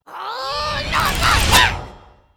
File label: Character Blues Rage attack; Angry Birds Epic